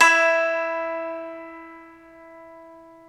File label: SU GZHENG, Earth Tones